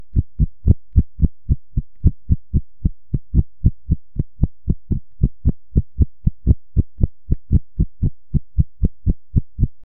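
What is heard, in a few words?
heart monitor, machines; monitor cardiaco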